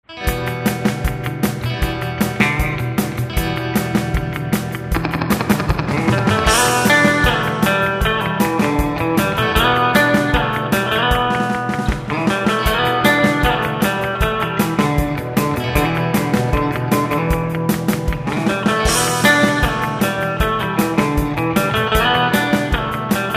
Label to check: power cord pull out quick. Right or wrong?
Wrong